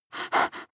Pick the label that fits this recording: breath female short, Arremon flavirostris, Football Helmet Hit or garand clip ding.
breath female short